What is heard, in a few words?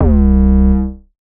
808 one shot C; Slate Digital BASSHOLE Sample Pack